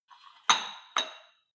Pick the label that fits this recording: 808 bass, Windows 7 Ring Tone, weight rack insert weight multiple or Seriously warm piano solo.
weight rack insert weight multiple